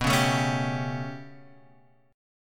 B 7th Suspended 2nd Sharp 5th down Guitar Open G, chord